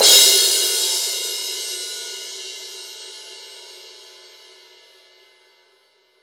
crash 18

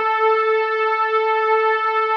WAVE PAD

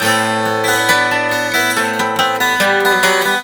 GTR, Celtic Flavours; USB Soundscan vol.42; AKAI

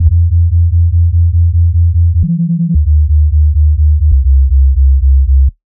BASSLP, Bass Loops; neuro; Stanza

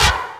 Sample, Roland MV8800, BREAKWILDKIT

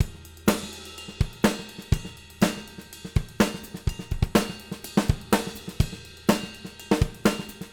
124, Living Drums

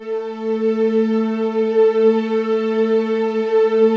PROPHET VS, Vintage Keyboards

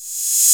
CRACKED BA40, Techno Trance; E-MU Formula 4000 Series Vol. 2

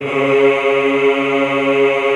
EHH C#2, Classical Choir; Best Service

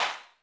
Dance Kit, Korg 01W

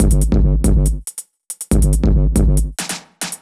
Beat - Kick & Hats, Grime, 140bpm; Zero-G Sound Sense Grime Scene; GS Construction Kits; Just Flex

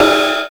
ride, trash kit; USB Soundscan vol.11; Drums Dance & Groove; AKAI

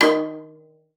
D#3, VIOLA FINGER; Xsample VOL-5

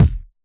hip hop kit kick

